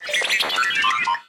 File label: Drone; rabbids multiverse; iOS, macOS, Mobile